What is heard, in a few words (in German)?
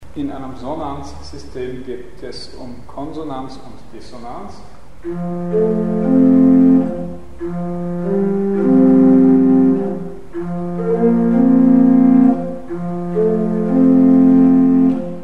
Sonanzsysteme; Mikrobuch